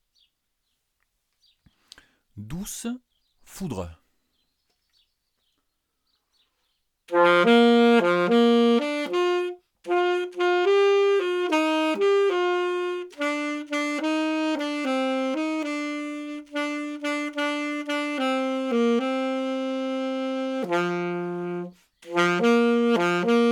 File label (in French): douce foudre saxo